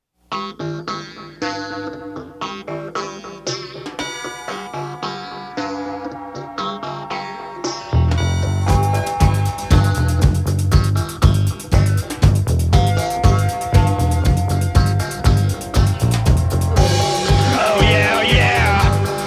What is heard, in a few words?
Flaming Fire High Bell; When the High Bell Rings